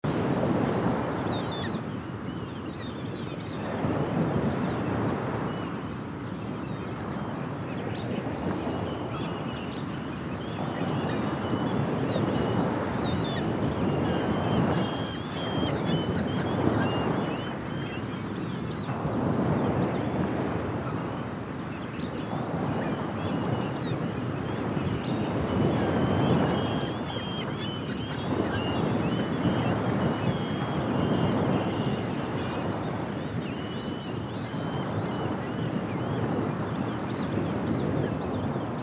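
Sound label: Ambience Ocean Harbor Birds Loop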